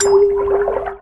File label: fish pickup; ludum dare 48